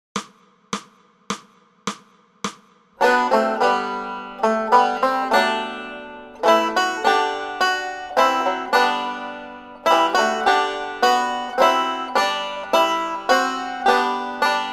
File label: Banjo; The First Noel; Banjo Tablature with Play-along Audio Tracks